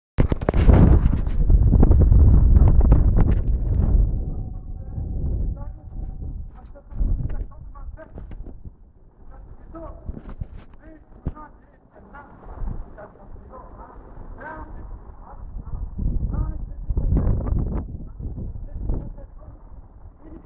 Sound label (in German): hanauer landstrasse cafe neben kiosk ostend